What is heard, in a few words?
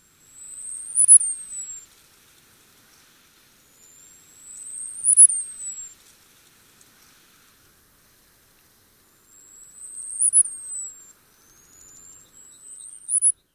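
Costa's Hummingbird song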